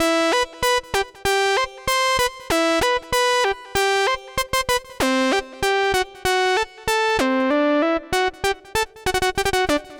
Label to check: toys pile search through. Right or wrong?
Wrong